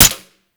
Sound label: Mech Full Auto, Pistol; Amphis; Warframe; Grineer